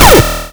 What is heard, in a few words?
laser